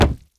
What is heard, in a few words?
bodyparts fall; Doom